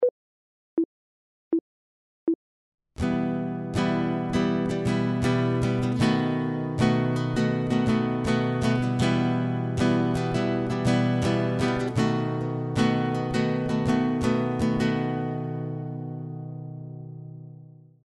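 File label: chord, guitar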